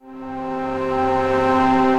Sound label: Downfall, Ominous Pads; Ableton; Mood Reel